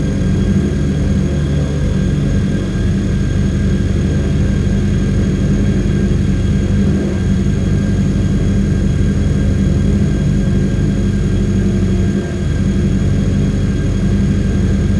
reactor hellburn medium; AA Dangerous Meltdown - EK Compatible

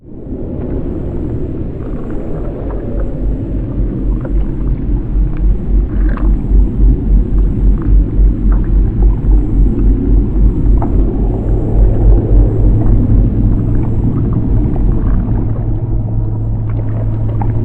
moving; Barotraumatic; Bossmoloch

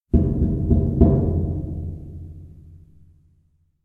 Moving; Barotraumatic

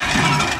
metal run, Footsteps; Thalassophobia Restored

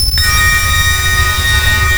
sonar Ping Water Very Close Beacon; Barotrauma; Real Sonar

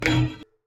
step Metal; Barotrauma; Subsurface

